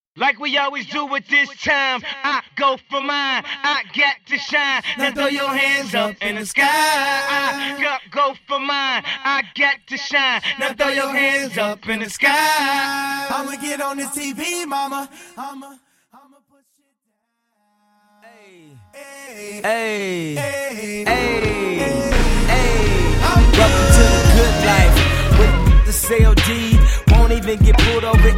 Hip Hop Acapella In Out 85 Bpm; Vegas Edit

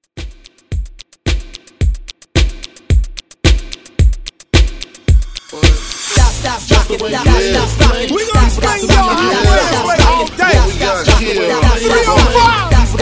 Hype Re Drum 110 Bpm; DJDOLLS

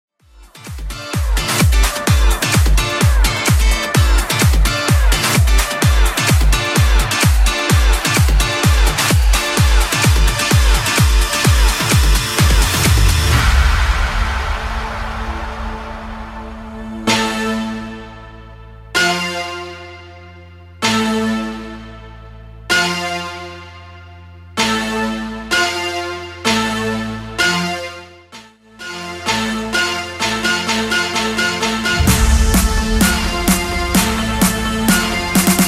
Epic Tone Intro Dancemix 128bpm

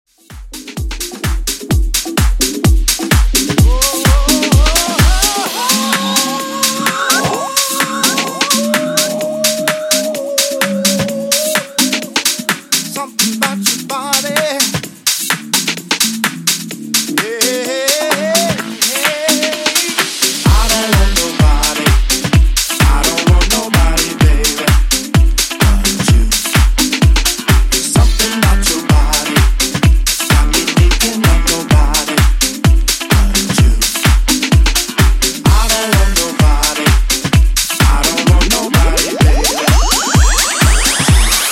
Electronic House, 128 Bpm

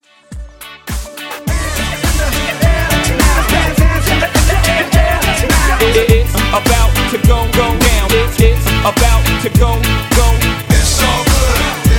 Ext. Redrum 104

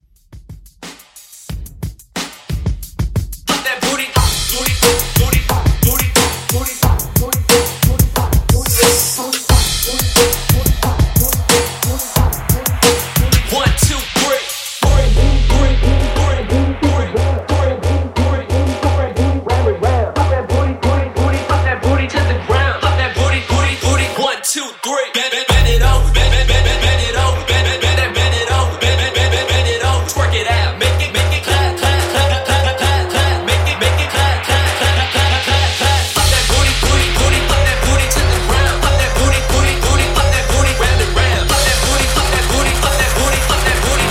Dubstep Trap Redrum Hype; Remixfreakz

